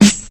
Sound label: natural snare drum sound g sharp key